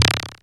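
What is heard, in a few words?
electronic noise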